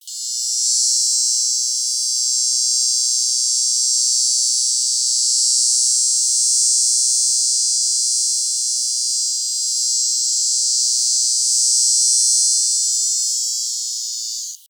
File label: Hadoa simplex, cicadas